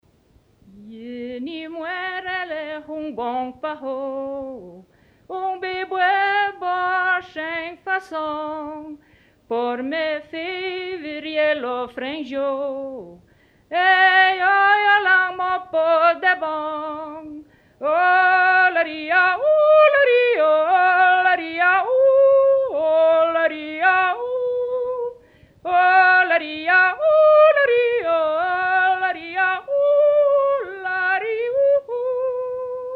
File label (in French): chant patois de Grimentz Anniviers; patois valaisans; Celui quon aime